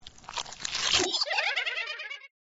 virus multiply; gardenbloom

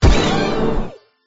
beehive hit; gardenbloom

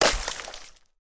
ice break; jewels

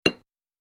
concrete Hit; tntbomb

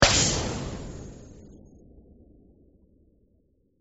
exploder trigger; gardenbloom

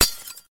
glass Hit; tntbomb

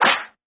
Slap

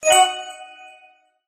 xylophone positive; jewels

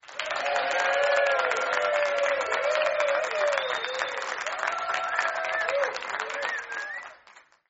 Cheer

